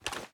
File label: reload drum start; gmod